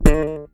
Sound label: FUNK BIT, Bass; Masterbits CLIMAX 7 - Bass; KEN SMITH BS